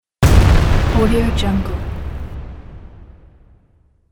shot

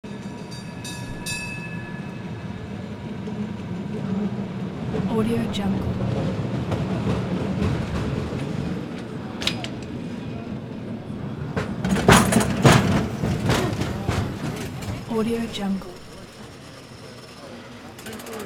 cable car arrive stop and depart

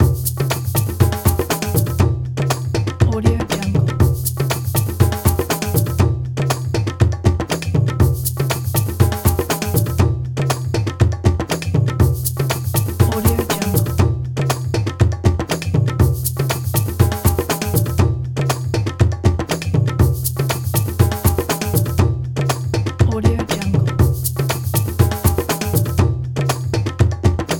music drum circle; prosoundfx

